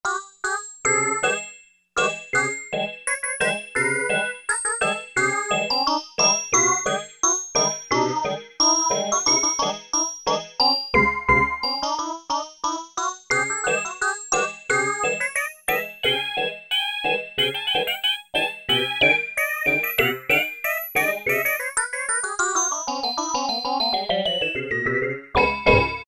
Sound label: D C O Wave, Roland MKS-70